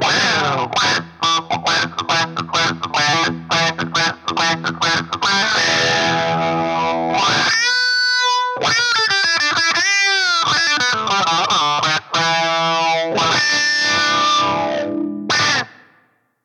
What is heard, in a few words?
Drive Range